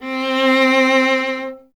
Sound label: STR VLA, Solo Instruments